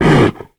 Monster Effort Big; rampage arcade gamerip 2018; Monster Ralph

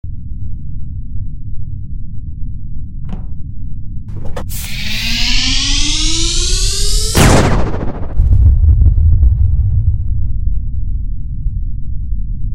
Big laser

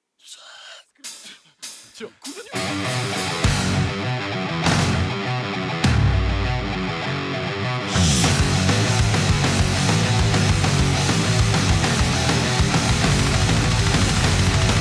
Rock; Trains Colliding; JDM Japanese Drift Master

